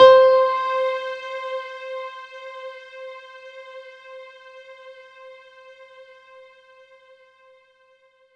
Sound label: C5, Synth Line; 90 s Sample CDs; NiceBeats; SynthLine VOL-23